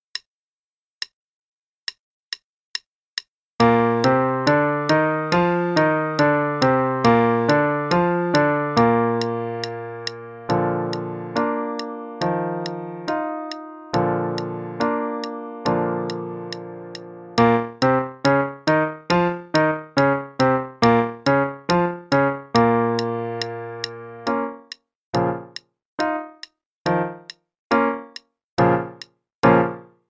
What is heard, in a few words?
Warm-up in Bb, Major then minor, qn=140; Level 2A - 2nd Ed - page 63